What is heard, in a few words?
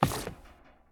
stop metal; Escape from Tarkov